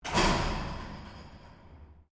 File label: Door Metal Glass Heavy Slam Stereo, Heavy aluminium and glass door; Metal Doors